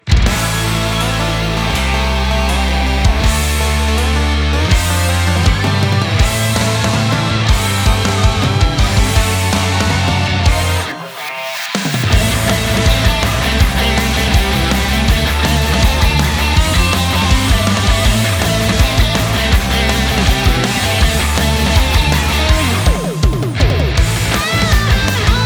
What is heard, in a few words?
Weathering the Storm; XII; Mega Man 9 - Thunder Tornado